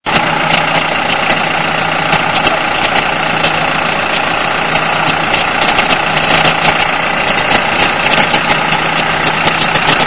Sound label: microbus; 着信音やアラーム（目覚まし）音に使える、着モーションとして; FOMA